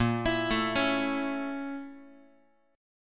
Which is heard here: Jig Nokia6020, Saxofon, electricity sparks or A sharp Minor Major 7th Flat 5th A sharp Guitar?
A sharp Minor Major 7th Flat 5th A sharp Guitar